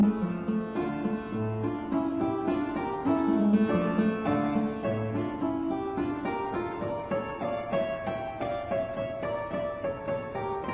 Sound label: Keyboard Sonata in E minor, Minuete; Silva